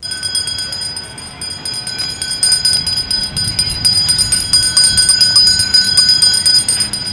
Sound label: handbell; Motorola MPX200